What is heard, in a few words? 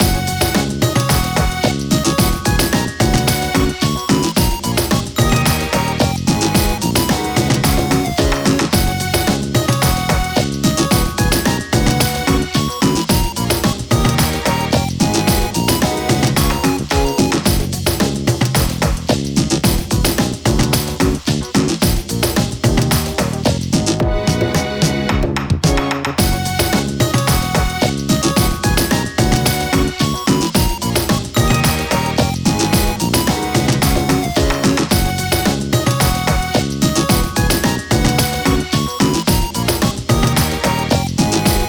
Jingle Stamp; Taiko no Tatsujin V Version; PS Vita